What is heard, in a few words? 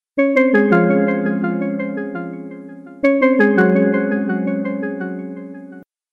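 short sweet sms tone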